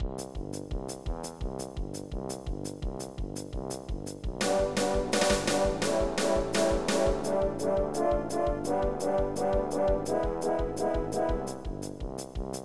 marching

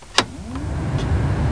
On fan; PLANET X

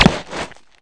bulit hit; REDNECK; RAMPAGER

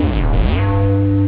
square

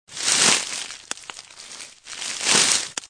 Plant Grow; mystic training

